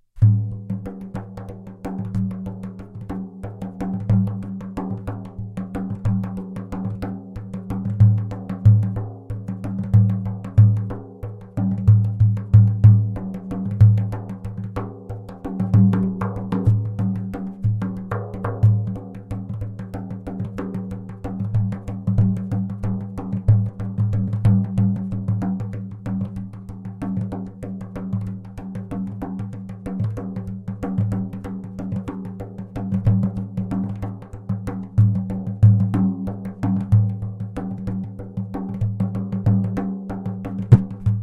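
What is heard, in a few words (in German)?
Ziegesound, Trommel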